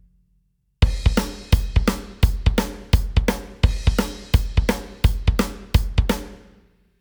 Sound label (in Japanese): メトリックモジュレーション ドラムリズム